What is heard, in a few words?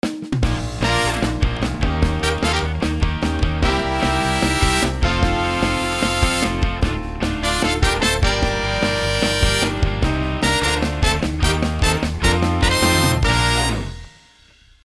Fast The Trumpet The Trombone; The Trumpet 3; Sample Modeling